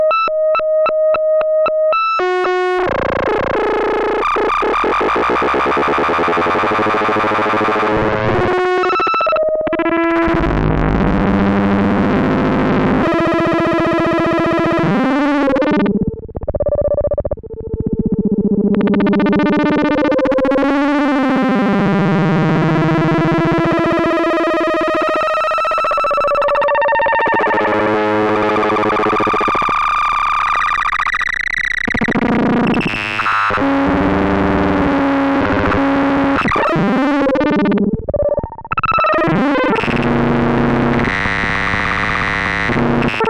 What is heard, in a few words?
granular cvs